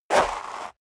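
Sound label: step dress boots gravel light jump, footsteps; schritte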